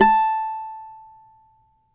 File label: CAVAQUINHO A, Guitars; Spectrasonics; Hans Zimmer Guitars VOL-2